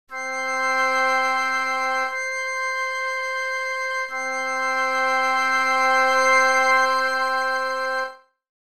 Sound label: interval Octave